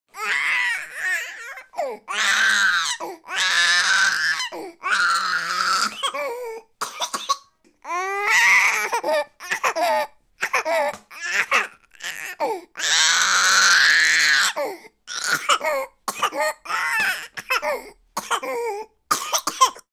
human baby 9 months frustrated crying and coughing; sound effects one